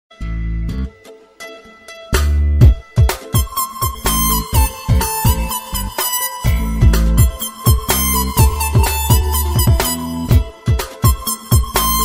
Superb Ringtone

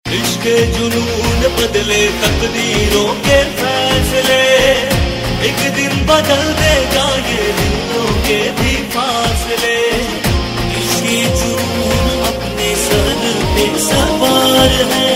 Title Tone; TV Serial Tone; Life OK

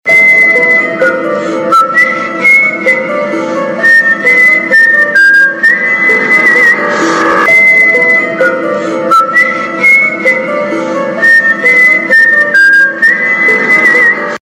Whistle Tune; Ek Hasina Thi; Star Plus

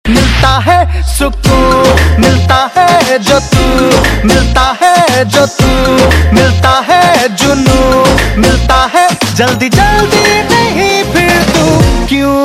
Beat Mix Ringtone, Bollywood; Welcome Back

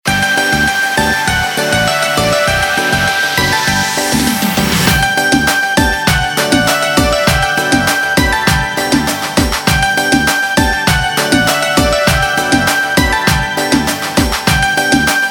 Instrumental Ringtone; Bollywood Hits (2015)

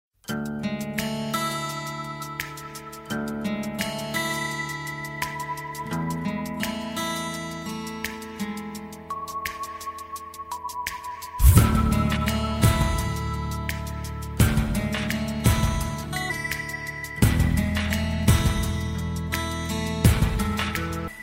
tone; Valentine Tones

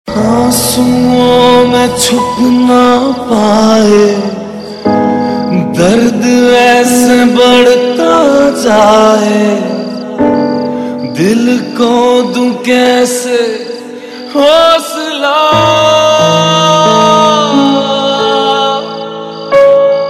Male Tune

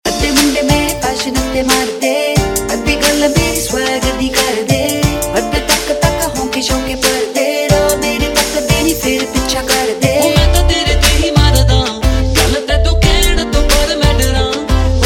Punjabi Version Ringtone, Bollywood; Beiimaan Love

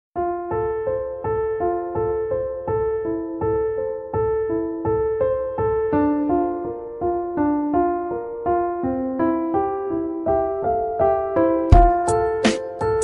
Emptiness Music

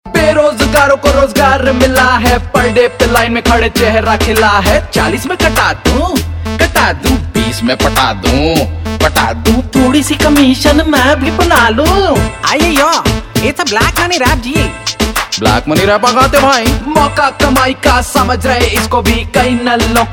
Rap Ringtone

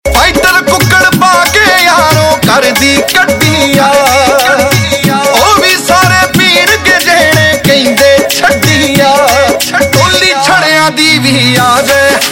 Bhangra Ringtone, Punjabi

